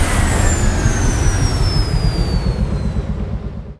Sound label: Machine Generator Start; Machinery - Generators